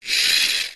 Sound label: leisure rc car roll wheels mechanical whirr short